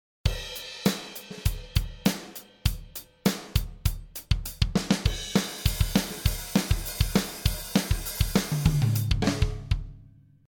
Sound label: Transient